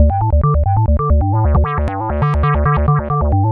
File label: Bass Loop